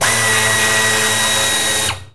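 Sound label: Air ratchet, air tools, industrial; Air toolks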